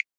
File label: Casual Hover